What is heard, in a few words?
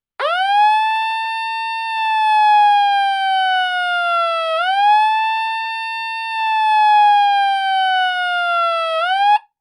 TOA siren